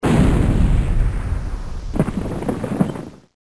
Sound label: lightning